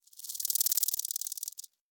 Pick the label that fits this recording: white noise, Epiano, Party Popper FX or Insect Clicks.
Insect Clicks